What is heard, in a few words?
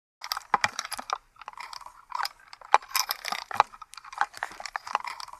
Camera case handling backpack